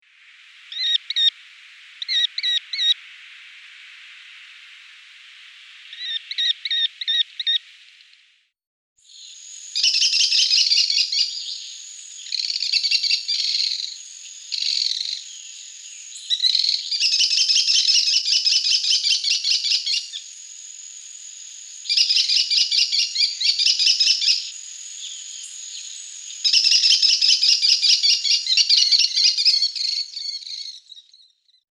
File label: Crecerelle amerique American Kestrel; Guadeloupe; chants des oiseaux